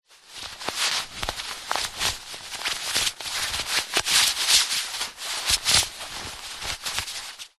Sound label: foley fabric thick fast heavy movement; zapsplat